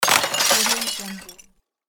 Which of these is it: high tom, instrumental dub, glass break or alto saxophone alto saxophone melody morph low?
glass break